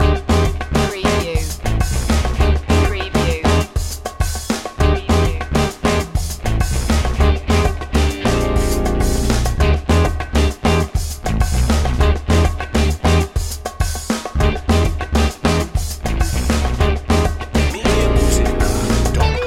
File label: funk side loop